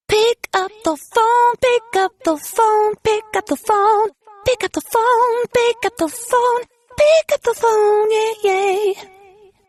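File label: pick up the phone, Sony Ericsson ringtone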